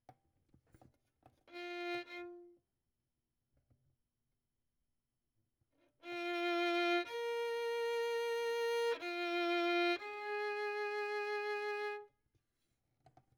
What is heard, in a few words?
Violin; Verdigris Music